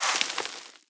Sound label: debris sand, bullet impact debris